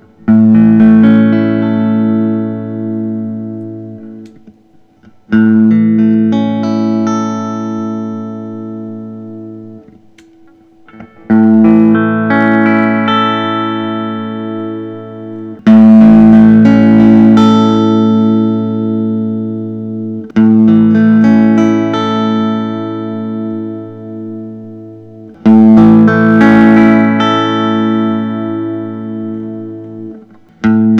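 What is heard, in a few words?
Parker Fly Classic guitar; 1995; pre ref ruby red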